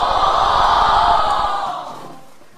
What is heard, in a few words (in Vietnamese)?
Tieng o khan gia ngac nhien